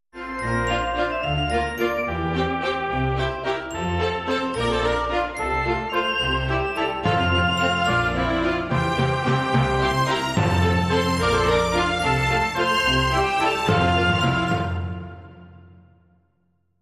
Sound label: Grand Waltz